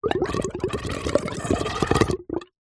water pipe gurgle; nature

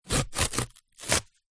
cardboard rip; foley

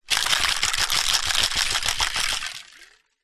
food drink cocktail shaker metal shake ice liquid

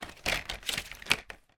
storage basket plastic fold open, household

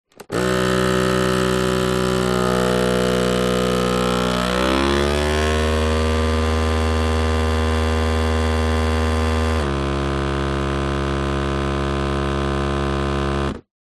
household food vacuum machine cryovac operate; zapsplat

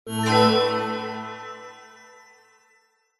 multimedia game sound negative magical

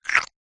cartoon animal lizard eat bite

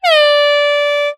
sport air horn 1x blast; zapsplat

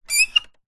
wood handle turn creak; foley

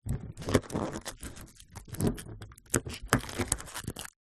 foley balloon tying knot; zapsplat

